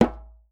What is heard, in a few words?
djembe; Voice Crystal - Steve Reid Definitive Percussion